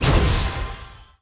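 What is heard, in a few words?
Elf Immunity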